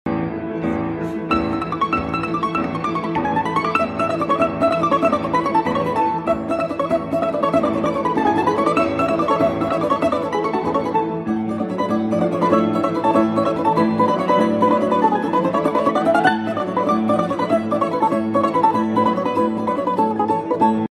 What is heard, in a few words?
balalaika